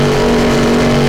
MID, Engine; Cars

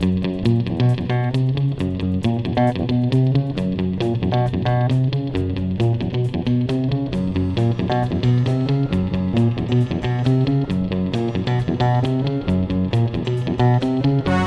music detective mission; TyTheTasmanianTiger3; XBOX

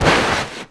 Player Slide

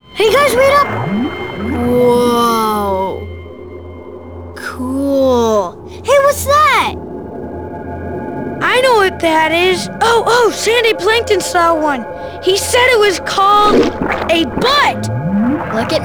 drop; XBOX; Finding Nemo; english

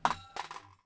drop, tavern; AMBIENT; Pirates Of The Caribbean

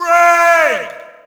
Shout, audience; UFC Tapout 2

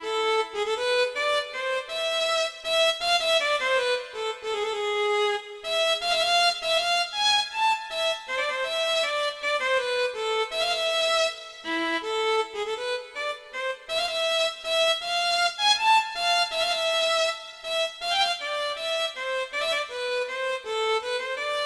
township wright; Sid Meiers Pirates; XBOX

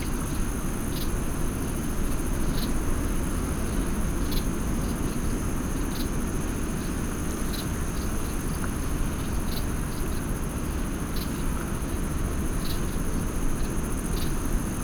Amb Outdoor Nite; Bruce Lee Quest Of The Dragon; XBOX

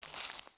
FS Weeds, footsteps

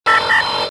console, ambience; kejim

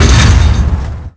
step left; Fab Boss

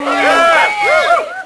solo; WhiteOut

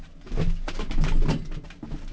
move, tavern; AMBIENT; Pirates Of The Caribbean

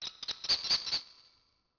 hawk; Ambient; MX vs ATV Unleashed

